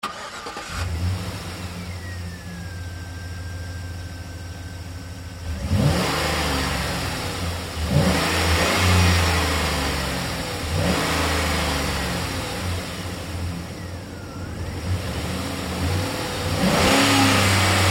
Truck GMC Savana Engine Start Revs Off Hard; Trucks; 2010 GMC Savana; BigRoomSound

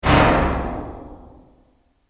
Bold Impact Sound; Miscellaneous Impact Sound Effects; Andrew Fleming

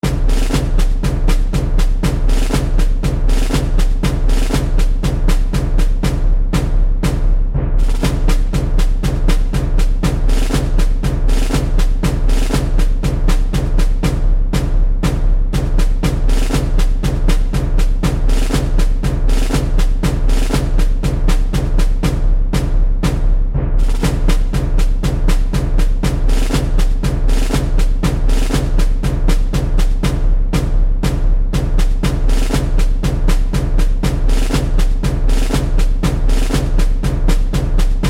Civil War Drums Seamless Loop; Musical; Musical Sound Effects

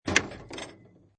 Pinball Sound Heavy Thump And Clank; Video Games

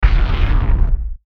Hit Deep, Hit Deep Static Swell; Lfe Ultra Low Bass Hit Impact; Deep Tones; Sound Effects:Sound Design; Blastwave

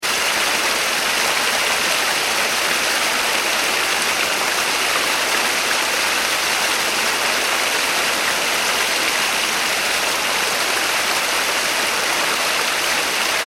water waterfall small; Rivers; Nature; McKinney Sound

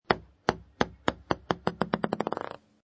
Ping Pong Table Tennis Ball Bounces To Stop; Ping Pong Sound Effects; Ping Pong (Sound Effects:Sports)

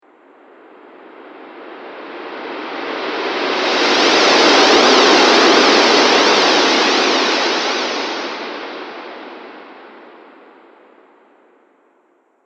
Space Whoosh Strong, whoosh sound effects; sound design

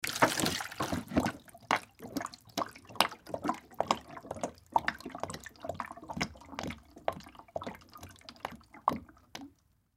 Jug Pour Empty, Water Jug Pour Empty Chug Gurgle; Pouring; Blastwave

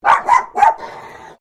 Animal Dog Chihuahua Bark Triple Snarl; Various domestic dogs; Dogs (Sound Effects:Animals)

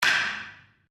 Wood On Wood

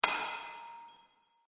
Metal Reverb Hit

